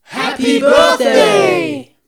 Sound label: Happy Birthday Crowd Voice Vocal